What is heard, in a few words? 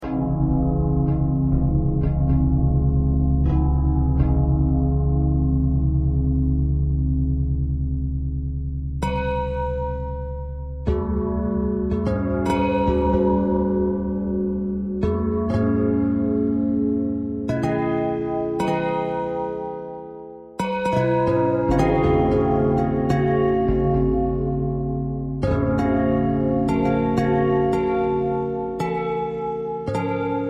bell thread